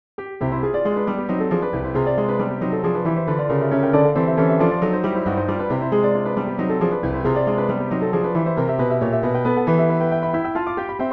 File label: Bluethner Model One Upright